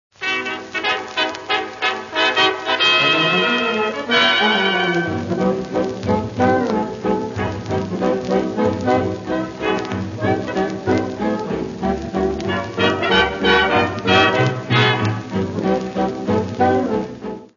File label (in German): WochenendFox